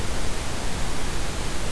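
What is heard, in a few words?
jet engine; thexder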